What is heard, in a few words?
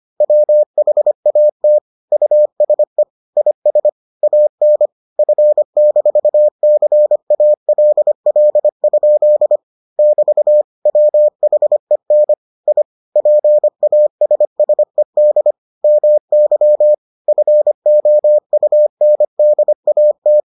morse